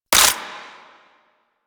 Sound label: AK47 Low Clip; Warframe; Tenno; Weapons